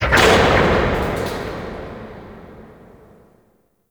Gas City Distant Metal Latch Huge; Warframe; Corpus Gas City; Ambience

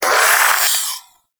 Shadow Vocal Grunt; Warframe; Duviri

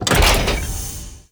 Powerplant Jettison Door Start; Gameplay; Warframe; Corpus Railjack; Tether Power Plant; Ambience

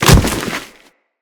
Bodyfall; Kubrow; Bodyfalls

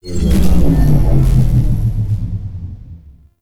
UI Mod Deactivate Shields; Warframe; Railjack; Player Ship

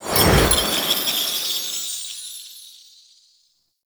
Cet Invasion Offering Generating; Warframe; Cetus

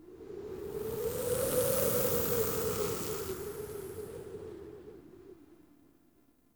Ext Wind Gust Calm Toxic; Ambience; Misc Outdoor; Warframe; Infested Eris

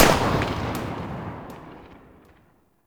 Viper Fire Tail, Weapons; Warframe; Vipers

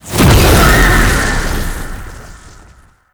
Brimstone Fully Charged; Warframe; Uriel

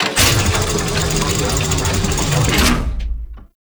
Asteroid Winch Lower Down; Warframe; Grineer Asteroid

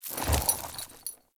Horse Brakes Trot; Vehicles; Foley Actions; Warframe; Duviri

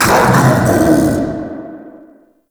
Cast Vocal; Uriel Brimstone; Warframe

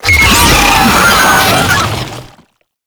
Attack, Vocals; Enemies; Warframe; Proto Infested; Brachiolyst

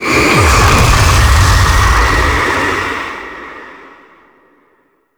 Tau Sentient Vessel Pain; Warframe

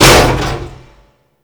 Frame Step; Warframe

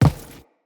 Crew Footstep Default

